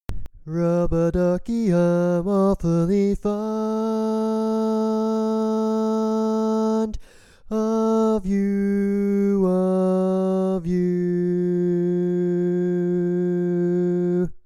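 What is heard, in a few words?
Baritone, barbershop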